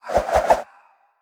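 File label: Dagger Attack Turn Around; we are the dwarves gamerip 2016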